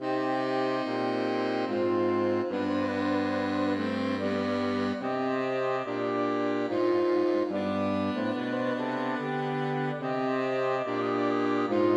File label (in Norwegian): Air for saksofonkvartett; Hertz